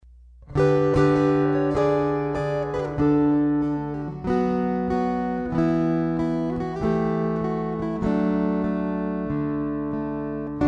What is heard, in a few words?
String Choir